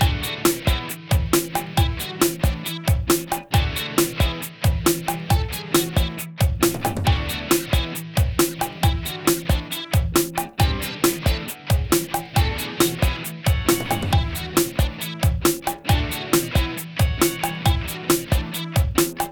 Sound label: 80s bodonk